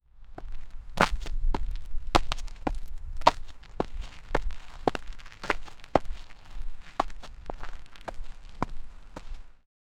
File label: footsteps on wet concrete